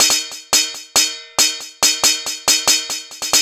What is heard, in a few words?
Saucepan Solo (140BPM); Loops